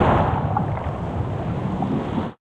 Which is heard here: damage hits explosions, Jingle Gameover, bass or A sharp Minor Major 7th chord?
damage hits explosions